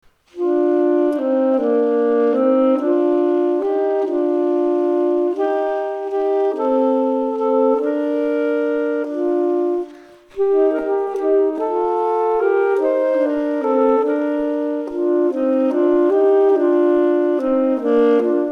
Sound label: Duet